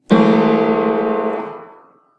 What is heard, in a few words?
piano